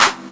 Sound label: CLAP HOU, Dance Mega Drums; Best Service